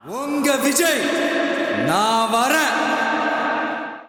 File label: msg tune; Unga Vijay; tvk ringtones